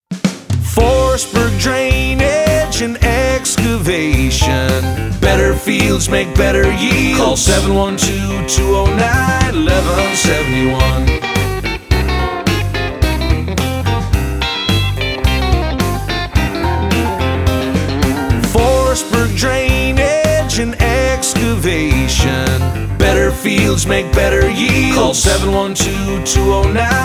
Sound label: Full Vocal